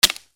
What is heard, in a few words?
BREAKING